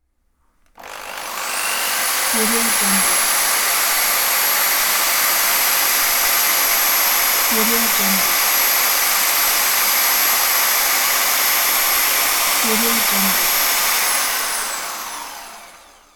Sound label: electric drill; almira23